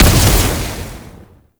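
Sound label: Projectile Impact; Duviri; Incarnon Dera Vandal; Weapons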